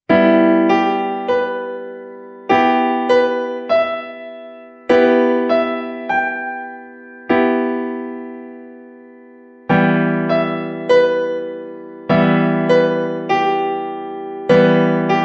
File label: Em Piano Keyboard Chord